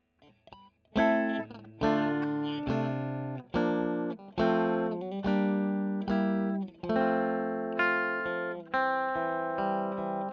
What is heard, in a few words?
E. GTR Royer